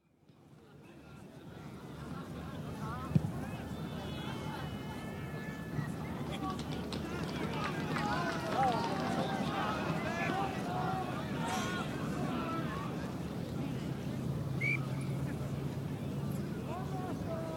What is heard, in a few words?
Rugby, county match with large crowd, scrums; scrums at 3m38s & 4m13s; Miscellaneous Sport; BBC